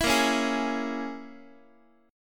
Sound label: Bb Minor 7th Flat 5th, Guitar; Bbm7b5 strummed